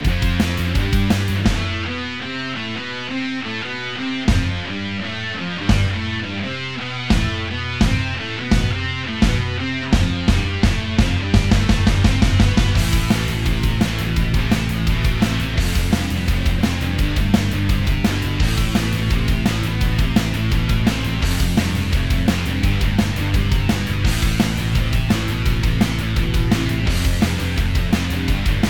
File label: Unmastered Mix Reamp